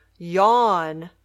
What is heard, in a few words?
yawn